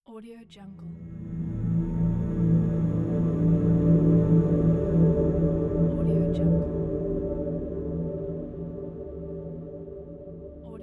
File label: harmonic ambience